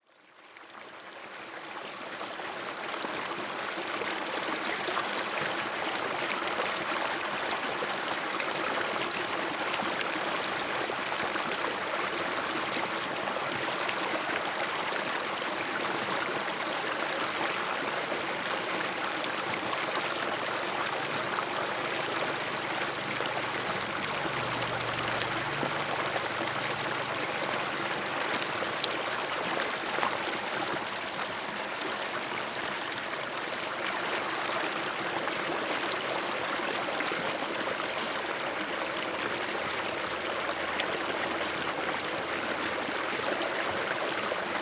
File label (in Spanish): asequia calle; san juan; pocito; calle 8